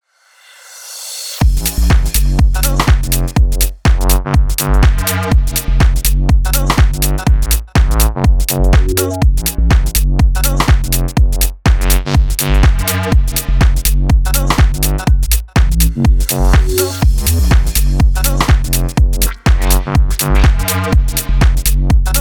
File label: bass house and garage; bass master